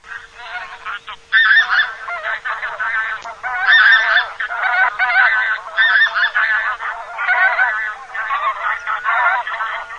OCA GRANAIOLA

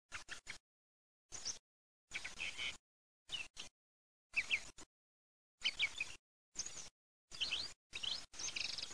TORDO

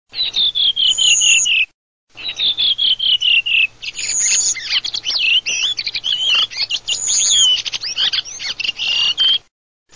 SASSELLO

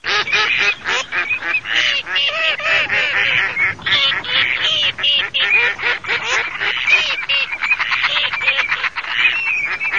GERMANO ALZ

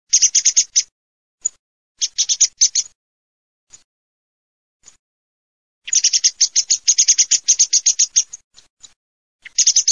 SCHIAMAZZO, birdsound

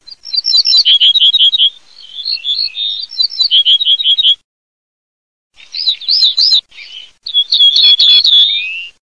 ORTOLANO, birdsound

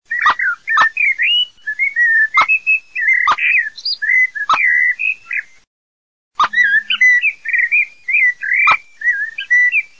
MERLO CHIOCCO PIPPO

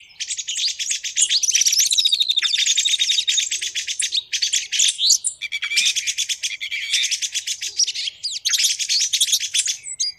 tordo

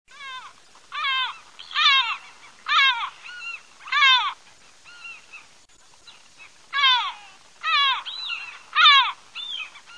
GABBIANI

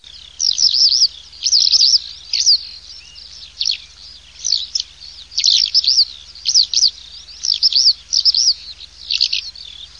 ALLODOLA

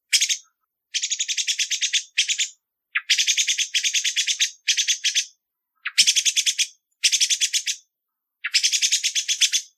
SCHIAMAZZO

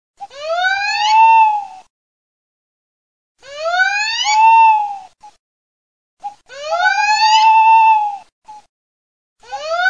ALLOCCO CIVETTA

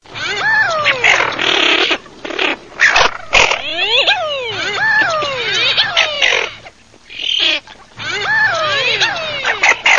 MORIGLIONE MORETTA moriglietta

